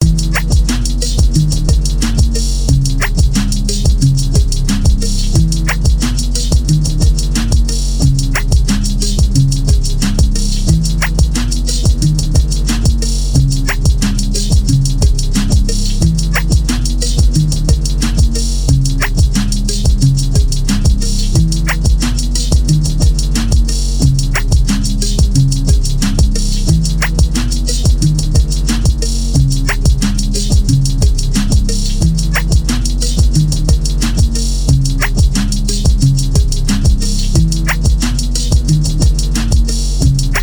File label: phonk distort beat